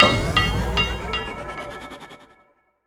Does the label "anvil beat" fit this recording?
Yes